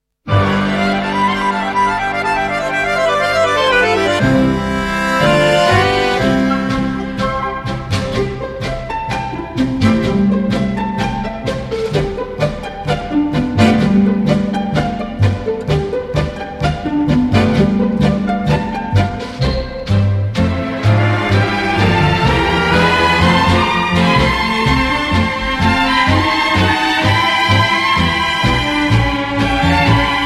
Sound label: Tango Pizzicato, tango